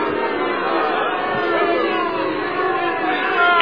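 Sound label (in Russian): неодобрение группа людей толпа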